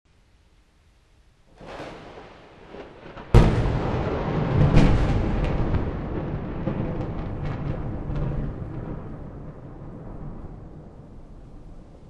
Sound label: thunderbolt